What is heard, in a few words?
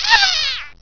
scorp die; svencoop